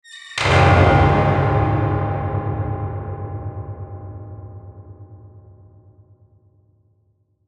METALLIC DOOR, Metallic Door Close Reverb; Metallic Sound; Metallic Sound Sound Effects; Production Element Imaging Element Accent Transition

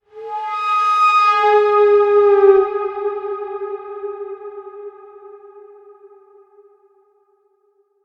Sound Design-Pressurized Air Release (Reverb); Sound Design - Pressurized Air Or Steam Release From Pipe With Reverb; Hisses; Objects; McKinney Sound

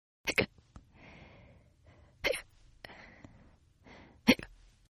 Hiccup Female

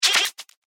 Double Laser Shooter; Andrew Fleming

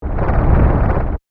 Whoosh Warble, Bubble; Whoosh Sound Effects; Whooshes; BlastWave

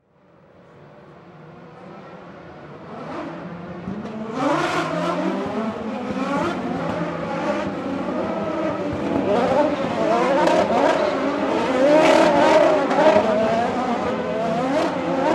Sports Grand Prix Race Cars Pass Bys; Racing

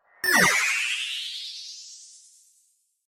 Digital Noise Wave Smash Remix; Part of the LYN094 package of Digital Clicks, Blips & Alerts; Digital Sound Effects

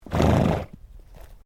ANIMAL HORSE QUARTERHORSE SNORT; Various domestic horses; Animals

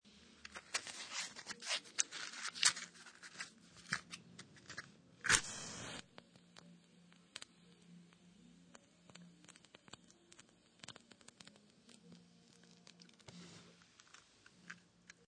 Matches Open Match Box Take Out Match Light Match; Scrape Sound Effects

